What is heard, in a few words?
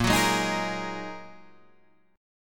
Bb 7th Suspended 4th Sharp 5th Bb